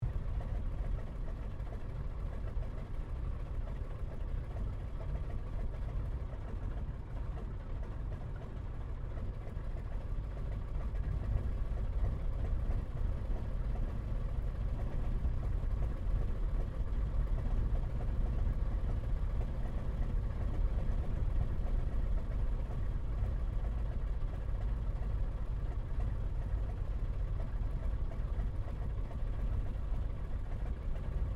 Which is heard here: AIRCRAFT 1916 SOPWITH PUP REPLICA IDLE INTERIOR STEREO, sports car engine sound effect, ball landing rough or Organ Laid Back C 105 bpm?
AIRCRAFT 1916 SOPWITH PUP REPLICA IDLE INTERIOR STEREO